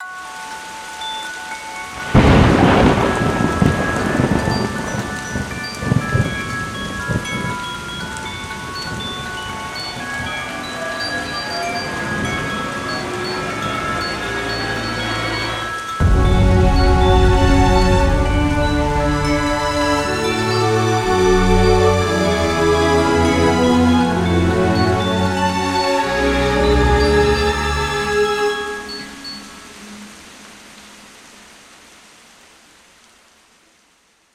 Cinematic Trailer Score Celesta And Strings With Rain And Thunder